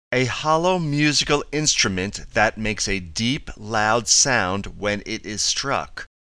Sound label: drum